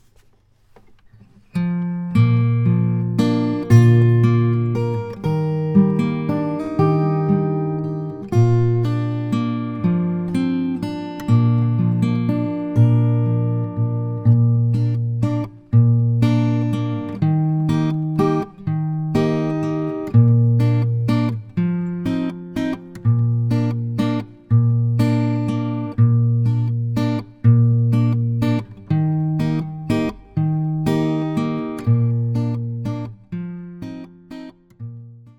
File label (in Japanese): ギター伴奏; 30秒